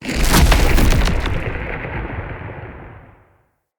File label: Lightning Blast Mark; Characters; Enemy; Abilities; Nimrod